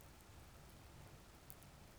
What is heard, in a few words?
pulses, BALAENOPTERA; MONACO 2022-07